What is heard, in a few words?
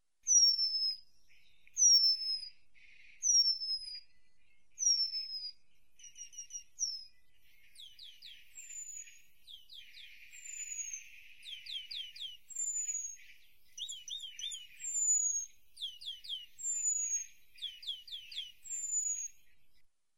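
obyknovennyy remez golos, penduline tit voice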